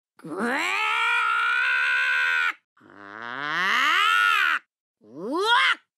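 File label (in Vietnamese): tiếng gồng lên hét lên hoạt hình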